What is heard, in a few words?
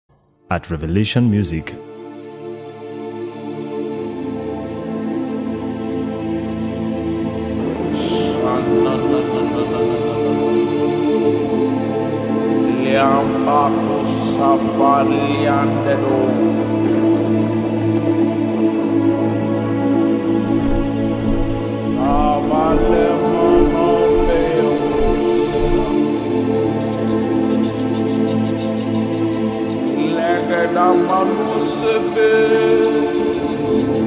Prayer Chant